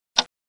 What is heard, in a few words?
tic